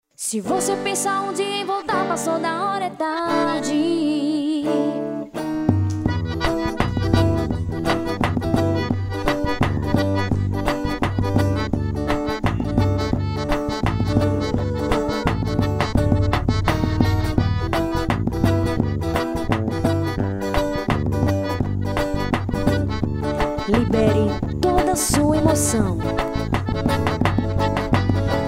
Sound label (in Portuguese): forró